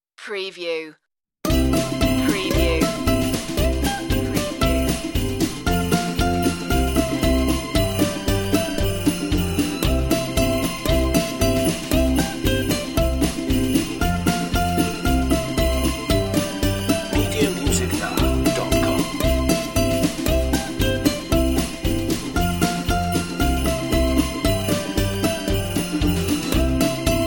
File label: Jingle Bells Ukulele, on-hold music